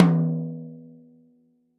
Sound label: tom hi, gretsch kit